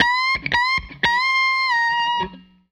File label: Tele Guitar Loop